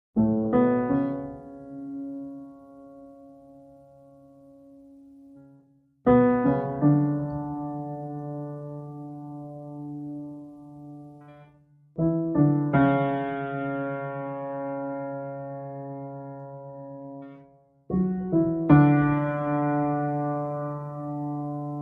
Simple Pianos

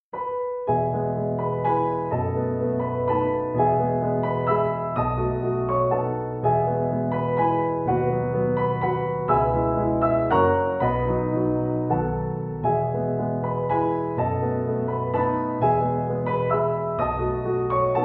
Waltz No 9 Op 39; Johannes Brahms (PD)

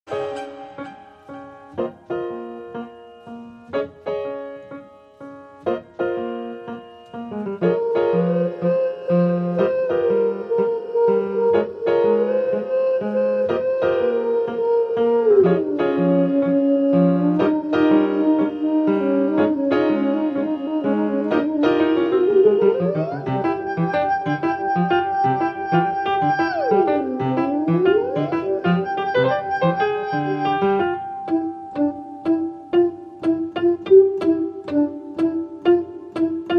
Rock

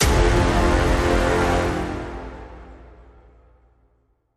Stab Big Brass (F)

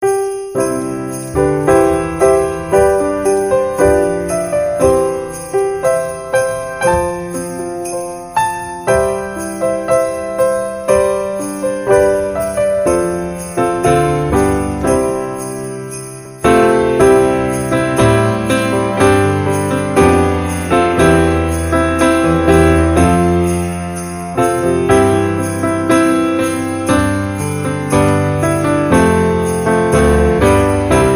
Auld Lang Syne

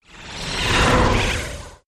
Big Heavy Flutter, Taylor Grand

